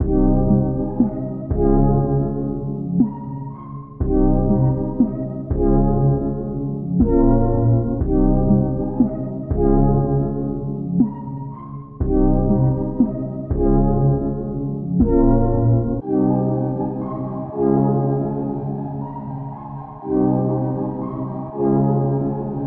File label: 120 BPM F Min, Melody; Cymatics; 2022 Melody Collection Platinum Expansion